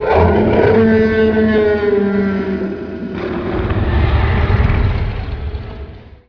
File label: die